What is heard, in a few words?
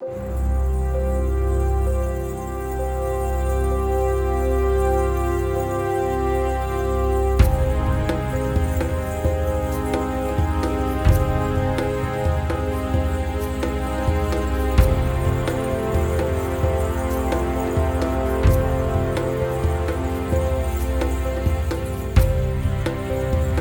Swell; Adera; Android